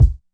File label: swing kick